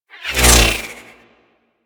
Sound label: Cluster Shot Whizzby; Enemies; Abilities; Teralyst